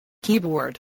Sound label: keyboard